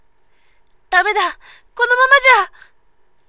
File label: damage, voice woman